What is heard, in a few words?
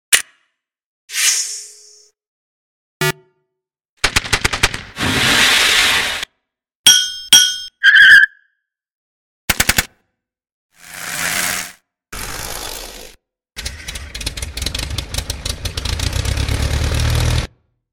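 soaring plane; vgm sfx; vintage collection; soring plane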